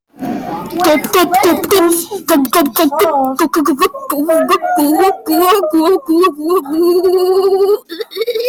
gulp gulp gulp gulp gulp gulp

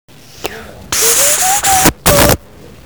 android beep loud

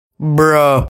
bruhhhhhhhhhhuuuuuuhhh

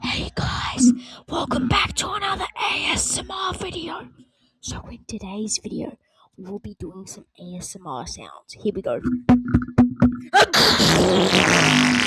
asmr

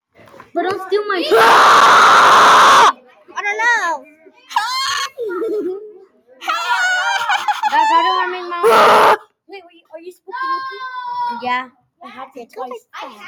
eh eh eheh ehhh ehh ehh ehe he

